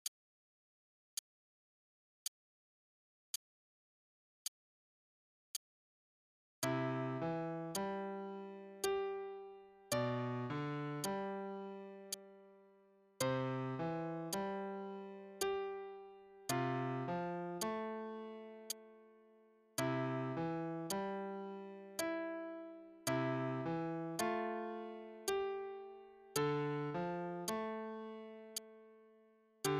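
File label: Play-along, broken, quarter note=56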